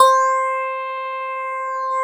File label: CLAVI C5, Keyboards Old School, CLAVINET; USB Soundscan vol.09; AKAI